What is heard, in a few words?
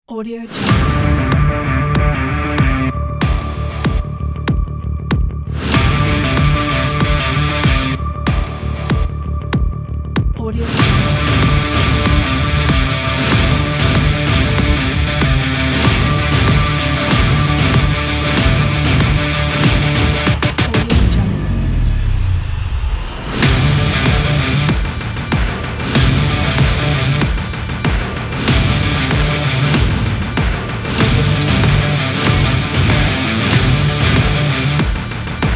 agressive cinematic rock trailer